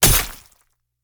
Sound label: blade